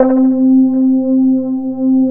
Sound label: ORGAN, 170 BPM; Ueberschall; Drum & Bass Flash Trackz